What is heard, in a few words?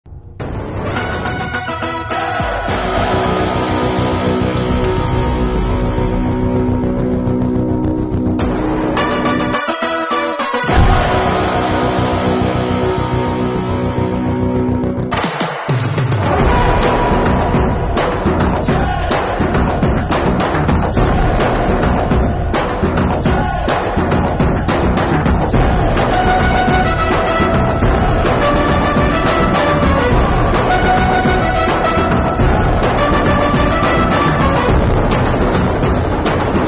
mass bgm ringtone; veera simha reddy; tollywood